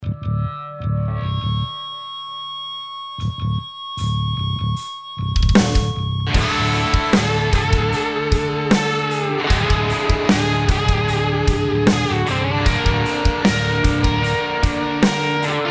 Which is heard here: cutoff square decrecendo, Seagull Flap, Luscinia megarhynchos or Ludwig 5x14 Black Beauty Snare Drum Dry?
Ludwig 5x14 Black Beauty Snare Drum Dry